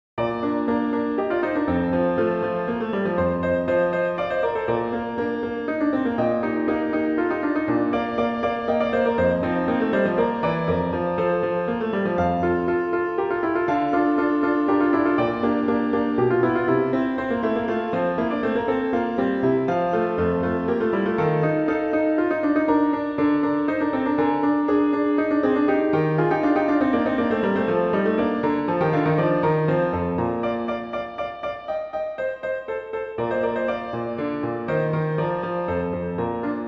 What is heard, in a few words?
Piano Only; Haydn; Gloria original (Little Organ Mass); Choral Society of Greensboro